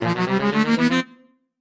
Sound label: Music Saxophone Ascend